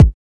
00s tight kick drum single hit a sharp key, Royality free kick drum tuned to the A# note